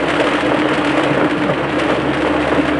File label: gravel; surfaces